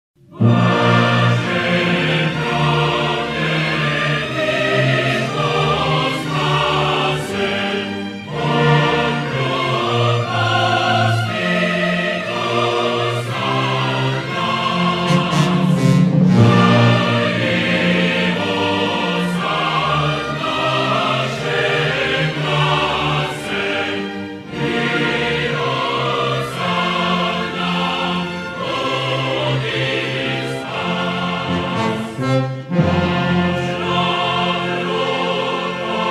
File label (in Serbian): himna